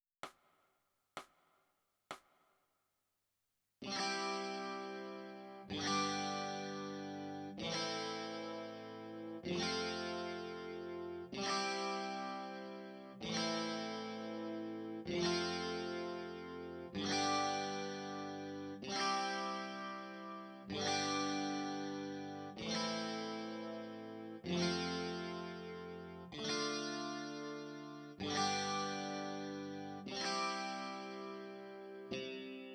E Guitar

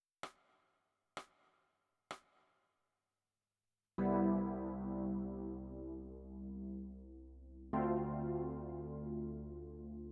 E Piano

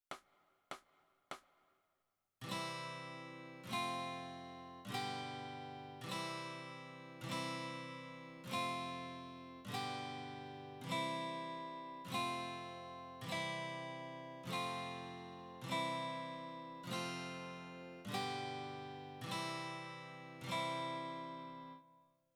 Guitar

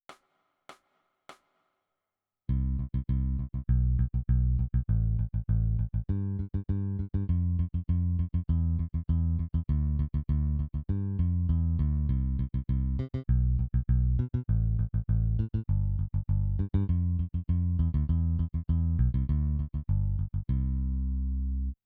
Bass

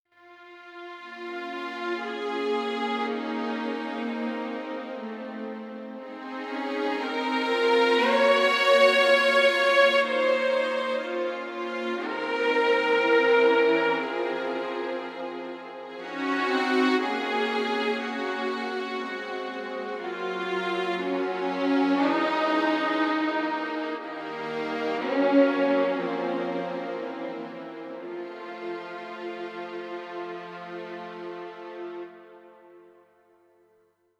3 Part + Solo; オーケストラサウンド; 『新・DTMオーケストラサウンドの作り方』

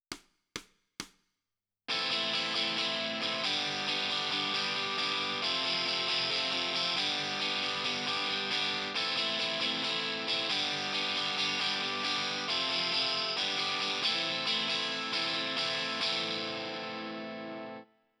Guitar Left

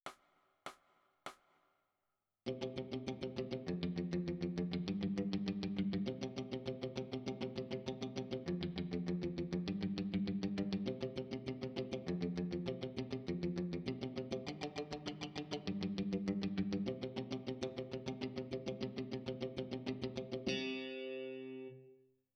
E Guitar

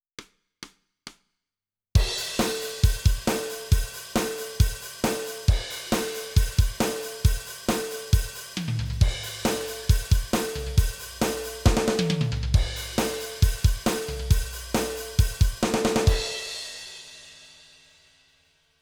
Drums

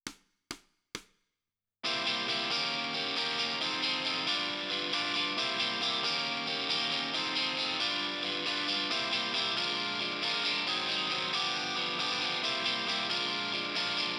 Guitar Left

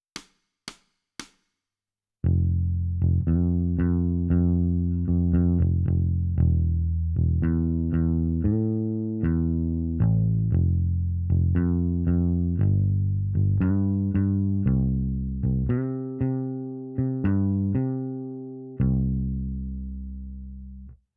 Bass

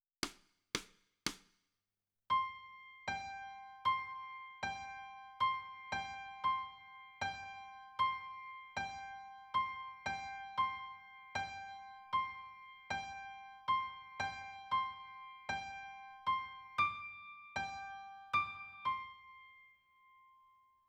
Piano

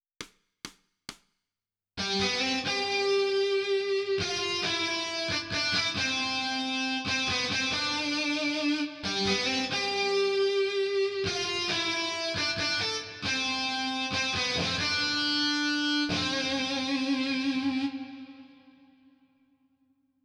Melody

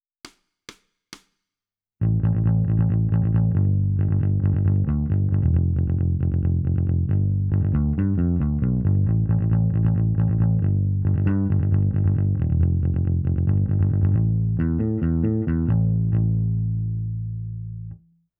Bass

